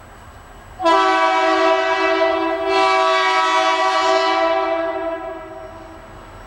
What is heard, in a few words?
Train Horn 2 Blast